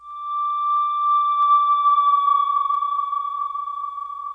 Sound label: Arc Slide; SpecOps2 MPlayer Demo